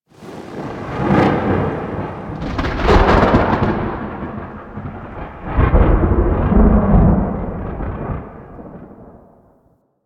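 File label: Thunder Ambience; Cinematics; Warframe; The New War; Throne Room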